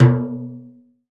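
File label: open hand, Timbale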